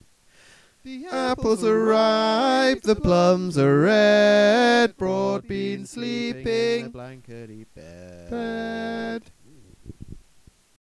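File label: Baritone, barbershop tags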